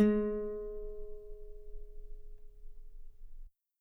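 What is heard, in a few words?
guitar G sharp 3 very long forte normal; bananenkeyboard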